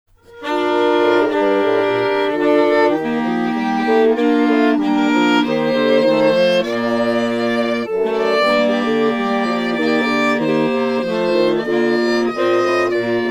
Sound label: Bach Chorale; SFPitch resyn